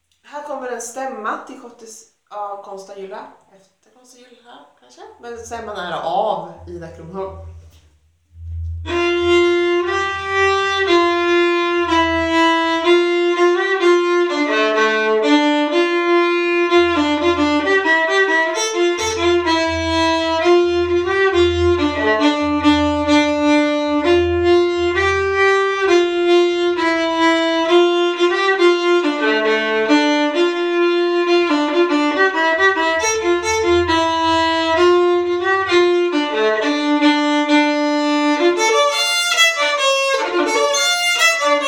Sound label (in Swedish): Schottis stämma; efter Konsta Jylhä